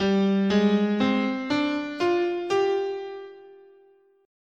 G Traditional Japanese "in sen", scale

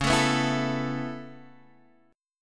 C sharp Minor 7th Sharp 5th, Guitar Standard; strummed; chord; x,4,7,4,5,5; JGuitar

